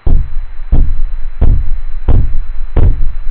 hard knock

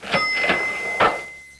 cash register

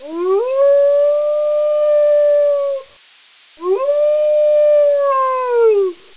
howl

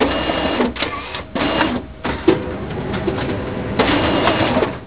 copy machine

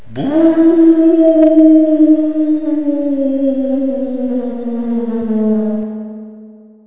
boo echo

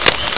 camera click

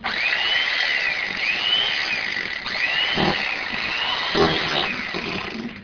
electric carving knife

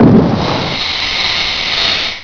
tire blowout

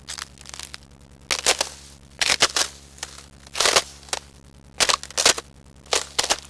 ice walk

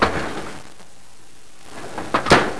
oven door

